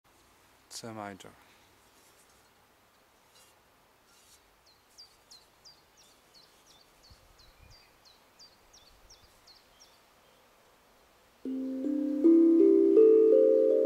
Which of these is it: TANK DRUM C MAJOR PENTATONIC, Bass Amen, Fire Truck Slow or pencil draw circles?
TANK DRUM C MAJOR PENTATONIC